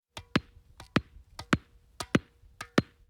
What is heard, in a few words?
tennis ball dribble